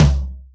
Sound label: 202 tom, Drums, Boss DR-202